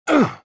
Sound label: pain; Star Wars Jedi Knight II Jedi Outcast; XBOX; bespincop